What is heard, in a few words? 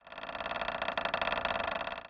Windmill Creak